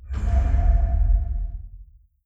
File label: distance dimensional horror tentacle attack; Stellaris; guardians